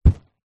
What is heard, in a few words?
balloon inflated catch; foley